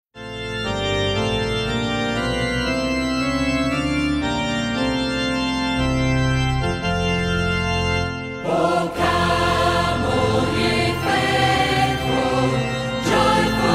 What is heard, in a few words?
O came all ye faithful (Classic Style)